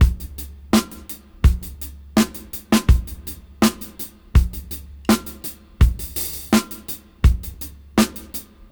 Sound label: Gresy 82 BPM; AMG - Keith LeBlanc Phat Butt Jams